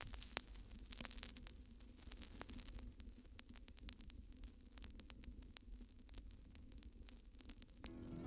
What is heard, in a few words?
Vinyl Crackles